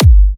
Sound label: G, Kicks; markus hakala